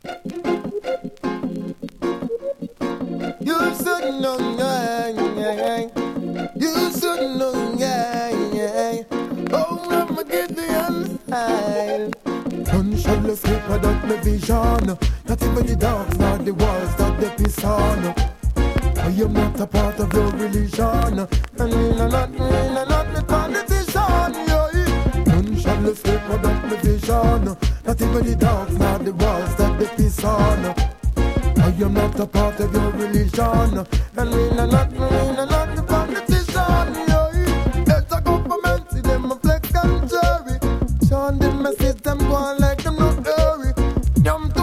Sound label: progressive riddim